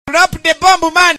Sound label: charge, Voices Emotes